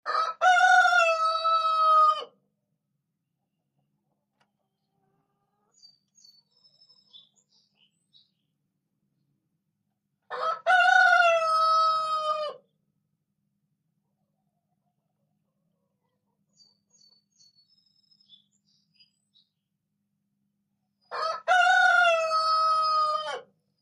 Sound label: Rooster crow in hen house ( recorded from outside )